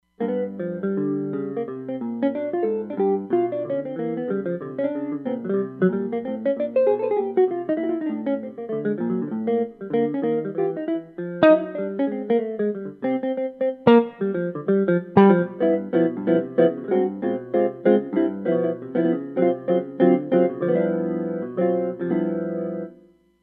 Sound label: DynoJazz Gtr, Roland Fantom XR